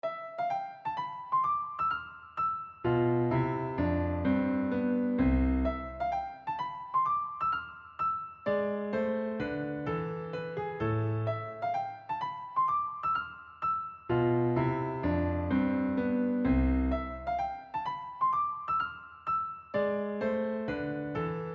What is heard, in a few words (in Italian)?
trio